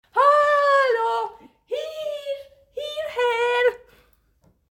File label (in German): Hier hin Rufe Schluss